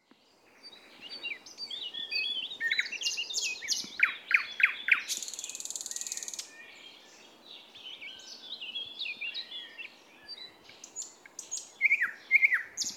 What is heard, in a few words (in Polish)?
glosy ptakow slowik szary Luscinia luscinia